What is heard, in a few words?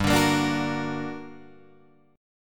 G Minor Major 7th, down, Guitar, Open G